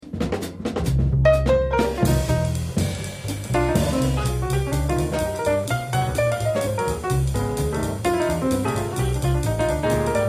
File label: piano solo